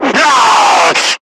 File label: Screamer Pain; Warframe; Lasrian